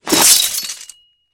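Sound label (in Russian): звуки попадания ножа по запланированной цели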